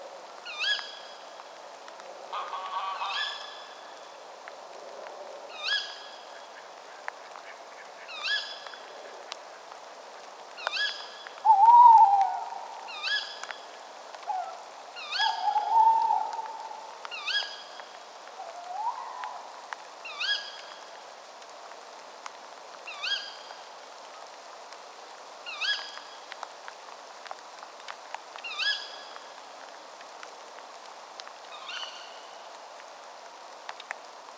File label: Tawny Owl Strix aluco